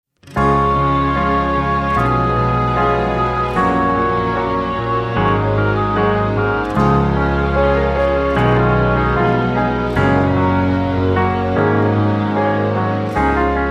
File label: (Db) No Backing Vocals